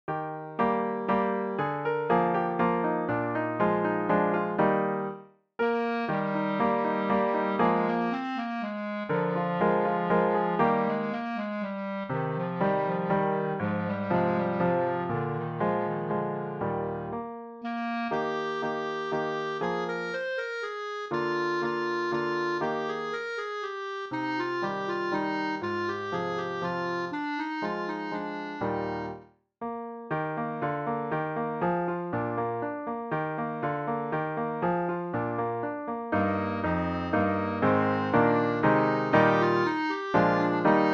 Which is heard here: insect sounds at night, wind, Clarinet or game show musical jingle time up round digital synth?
Clarinet